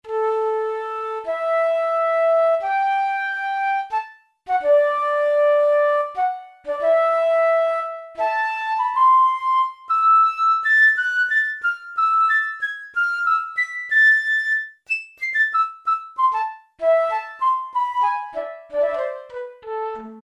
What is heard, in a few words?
Real Flute, E-mu Morpheus; Factory Presets